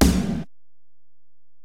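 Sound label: Acoustic Snare, Alesis DM-PRO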